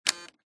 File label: technology video game nintendo wii console electronic click